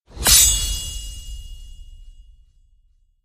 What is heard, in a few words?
Hit Bolt War Metal